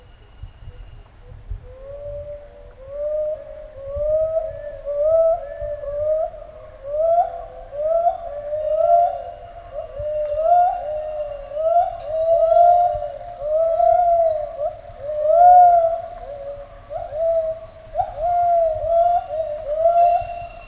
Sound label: duet, gibbons, vocalisations; compcog